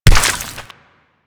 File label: Infested Crawl; Warframe; Crawling